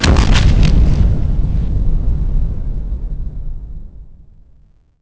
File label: boom; The Elder Scrolls III Morrowind GOTY; XBOX